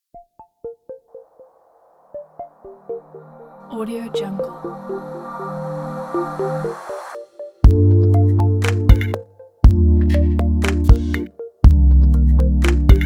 abstract tech experimental technology kit

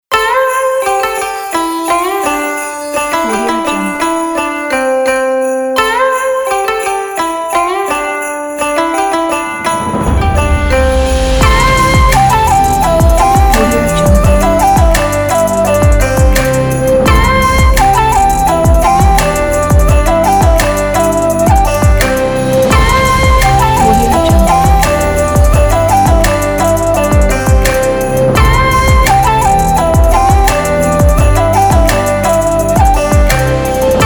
chinese new year

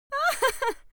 human vocal female laugh short; smartsoundfx

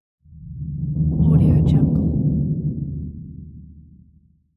bass drum roll

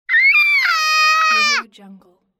small child screaming

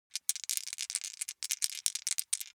foley dice small three rattle in hand; smartsoundfx

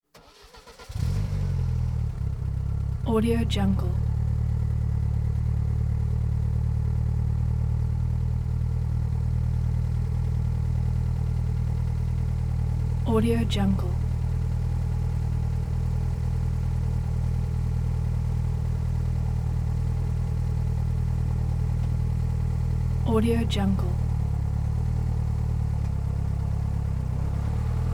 1990 lotus esprit turbo se engine start idle

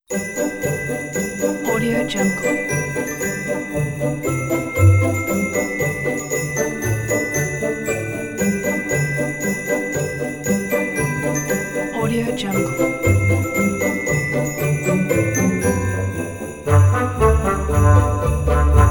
jingle bells classic

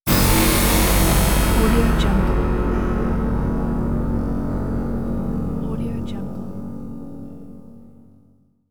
scifi impact

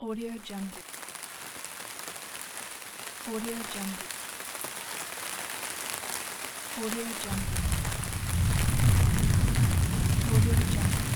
rain and rumble

